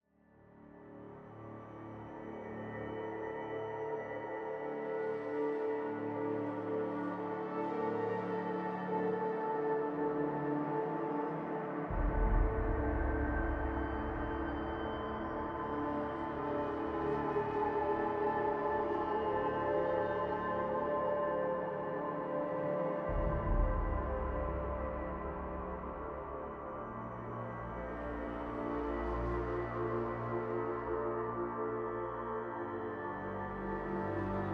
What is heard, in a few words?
music inqueue loop; ascension